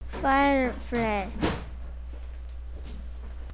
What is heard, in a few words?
fireplace